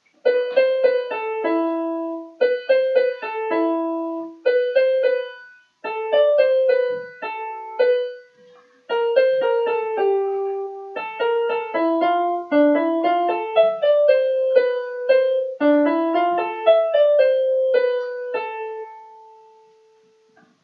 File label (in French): opera Cendrillon chant va dans le jardin instr